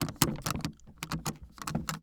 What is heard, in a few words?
Suitcase Latch Rattles, Household